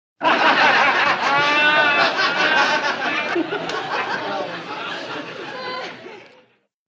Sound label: Crowd Light Laughter, medium